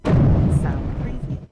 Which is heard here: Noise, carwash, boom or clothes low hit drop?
boom